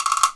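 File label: WOODBLOCK; ILIO; Synclavier Percussion 2; World & Orchestral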